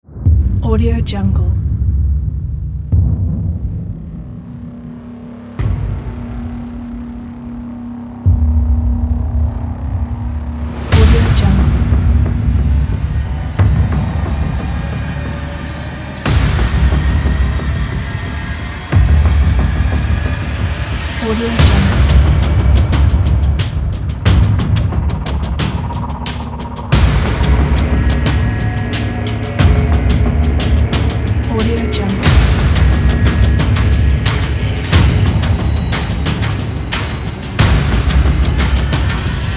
trailer horror hybrid

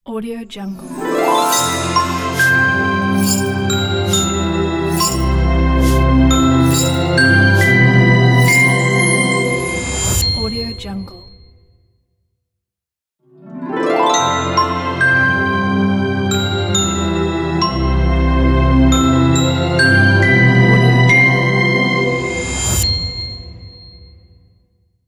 christmas opener ident

